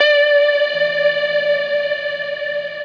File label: Guitar, noises; HYPERBITS